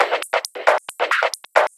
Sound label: Dance Grooves